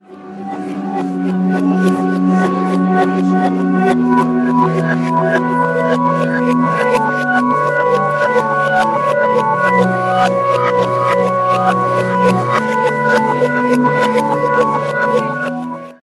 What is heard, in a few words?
Circus, sonnerie